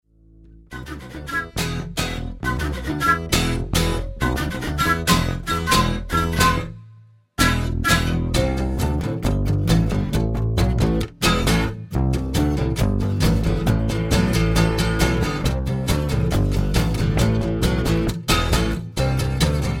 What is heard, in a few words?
Acou, Flu, Bass2